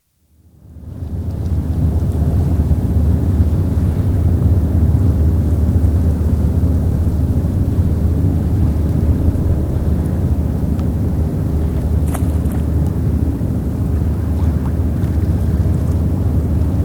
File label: Hong Kong: Harbour skyline, evening; Outdoor; BBC